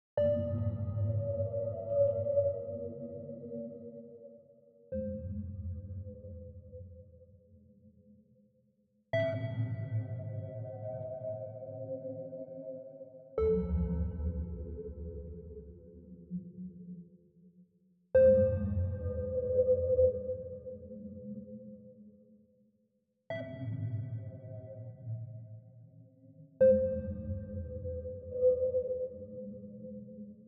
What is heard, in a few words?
Cube Ambience